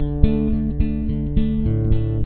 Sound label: interlude